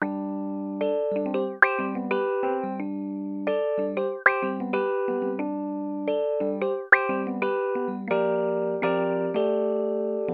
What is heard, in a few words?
Elektron Analog Heat, Mid Drive Filter Resonance Rhodes